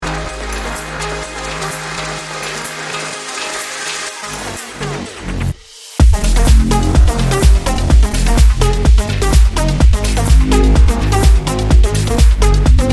melotic techno

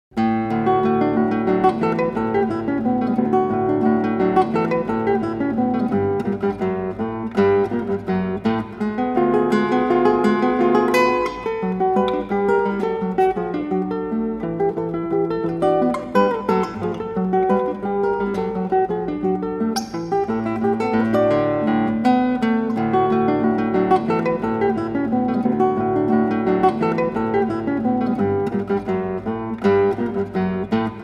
arr for guitar

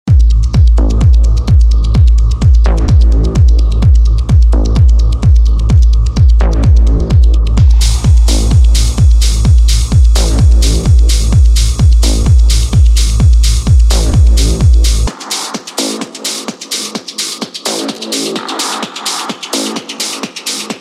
leftfield techno

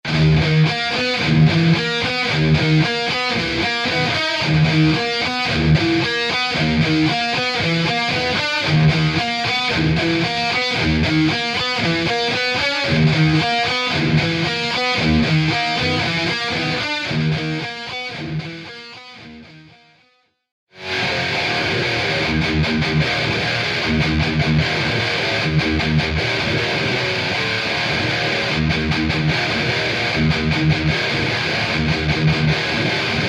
guitar tone kemper

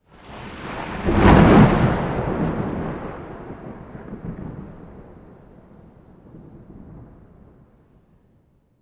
thunder clap

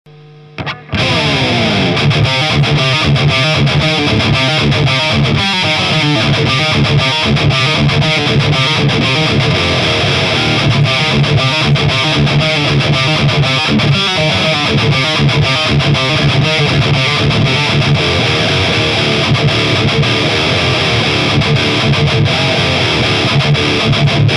mesa boogie mark iii blue stripe, charvel drop d; vfe dragon; san dimas

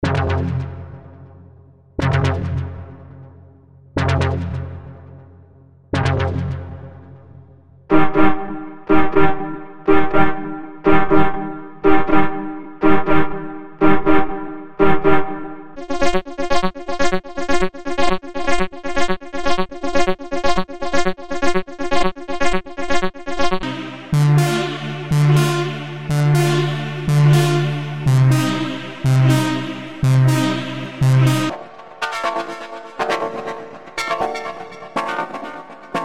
melodic techno

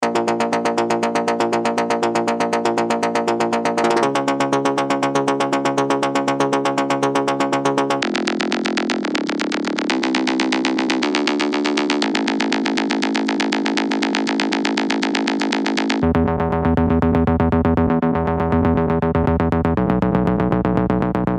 vocal progressive deep house

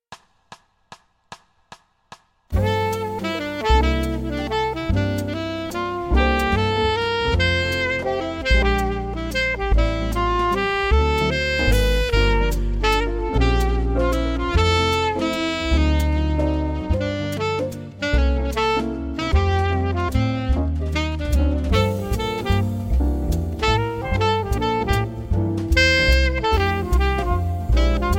alto sax waltz

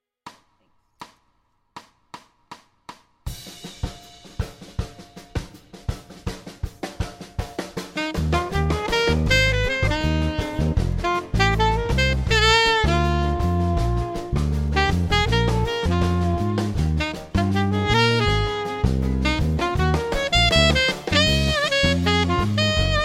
alto sax alt style

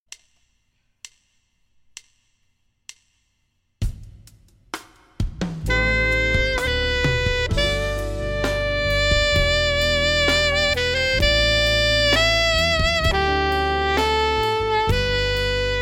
alto sax smooth ballad

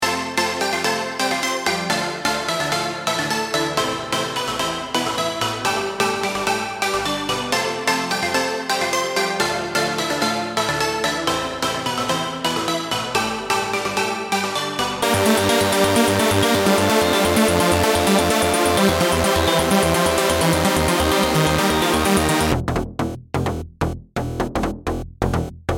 progressive house super synths

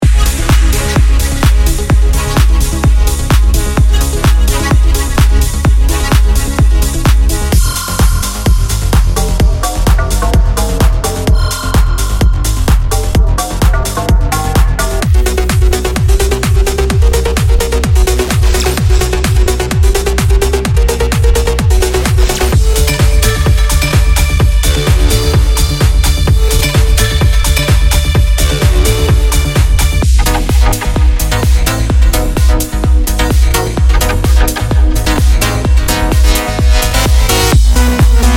techno

